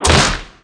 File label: hakaikei